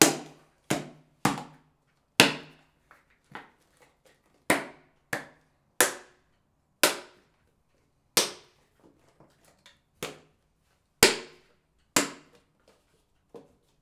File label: Plastic Bottle Body Hits; Fairlight Sound Lib